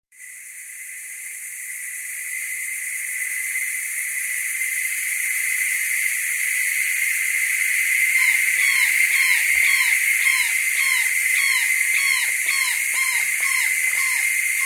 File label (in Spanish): sonidos de la selva